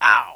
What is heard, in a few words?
Ouch